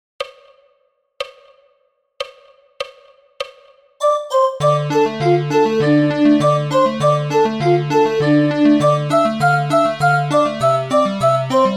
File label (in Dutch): sopraan; Flierefluiter 5